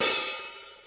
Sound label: HH HEAVY OPEN, Roland TD 7; drum machines